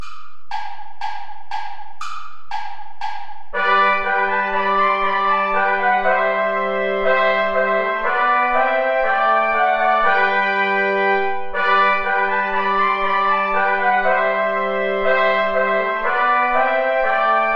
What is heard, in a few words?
play along without trombone; steuerlein johann mit lieb bin ich umfangen